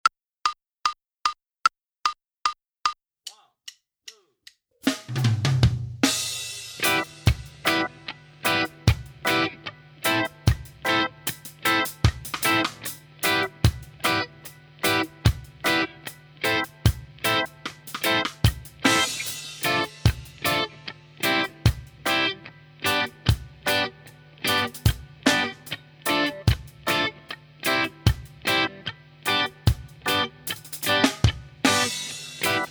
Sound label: Minus Bass; Inst Ensemble